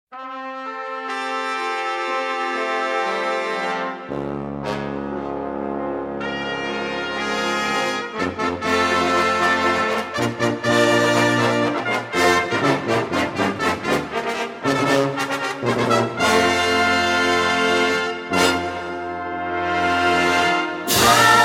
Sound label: Christmas Fanfare